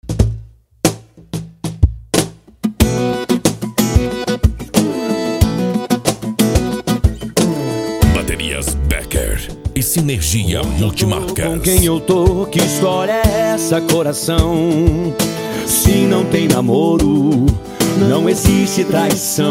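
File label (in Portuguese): especial sertanejo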